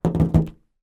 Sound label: foley balloon x3 taps fast inflated